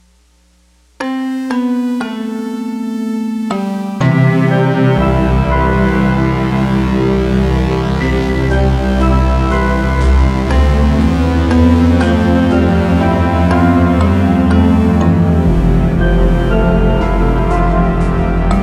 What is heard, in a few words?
Camp - Grump Camp Sad; bugsnax